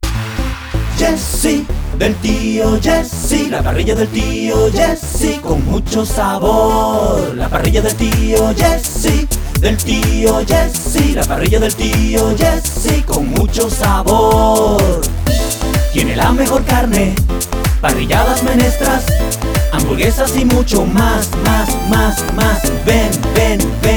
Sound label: jingle; La Parrilla del tío Jessy 2021 Completa